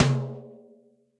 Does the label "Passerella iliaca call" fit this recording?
No